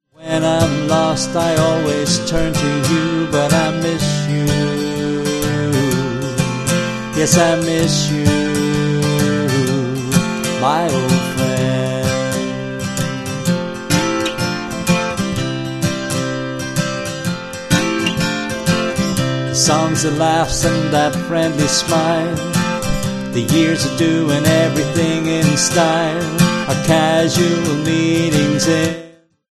acoustic

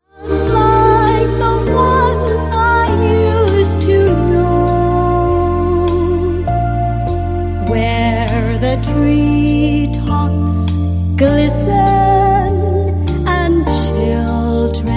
jazz and jingles

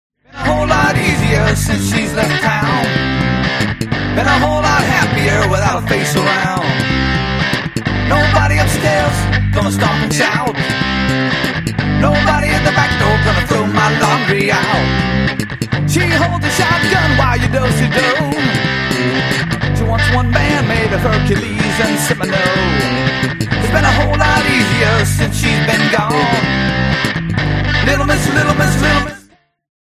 play along drums classic rock minus drums

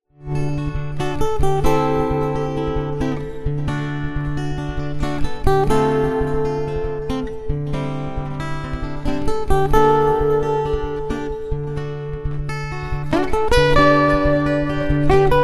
mandolin blues, calm

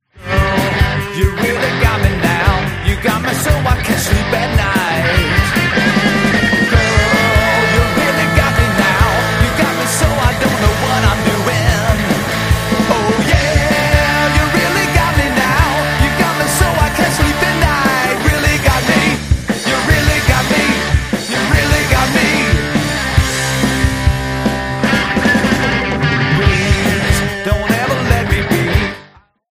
play along drums classic rock

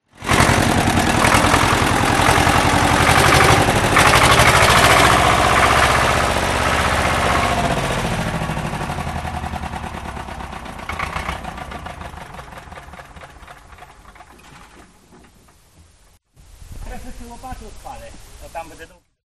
traktor i charczaca lopata mechanical pillar; contemporary polish village music

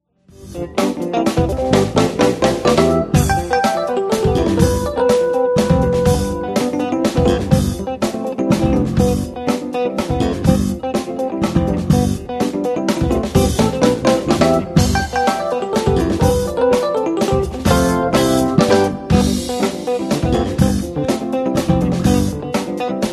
funk

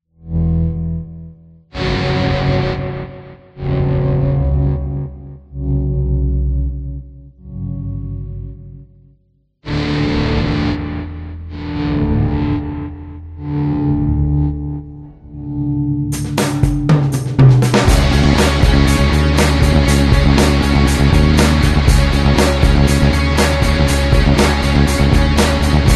contemporary polish village music

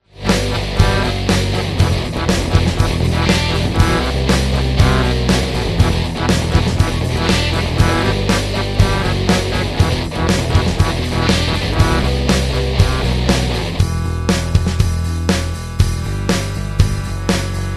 rytm rhythm; contemporary polish village music

